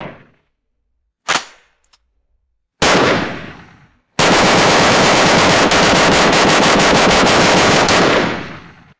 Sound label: rapid ar15 rifle gunshots